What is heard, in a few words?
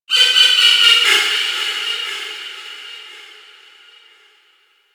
forest distant bird of prey; Ambience; Warframe; Grineer Forest